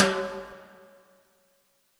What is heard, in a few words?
latin hit snare; flstudio